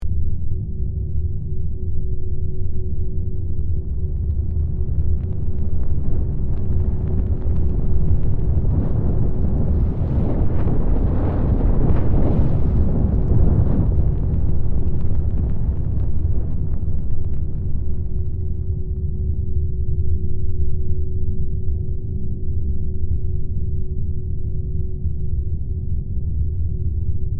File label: Space meteor passing by